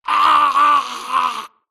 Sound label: grunt electro; Titanfall 2